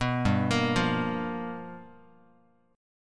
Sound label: G 7th Sharp 9th G chord, Guitar; G7#9 arpeggiated; JGuitar